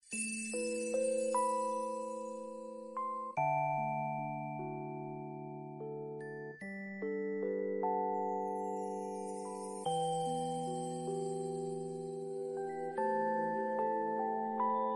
strong woman music

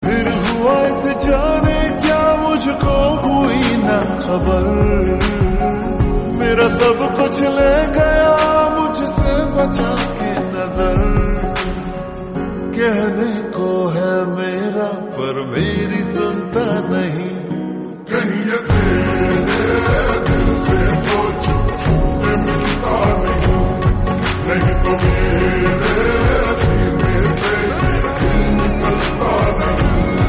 slowed and reverb

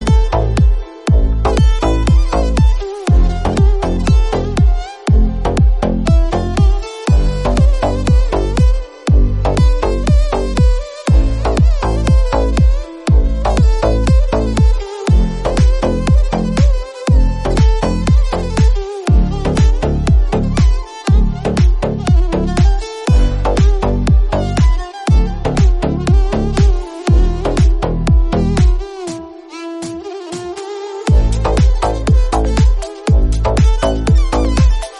background music theme

